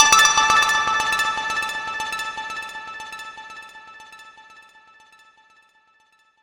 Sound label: Achievement unlocked; Deathrun TV